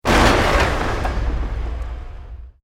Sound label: EXPLOSION MEDIUM WOOD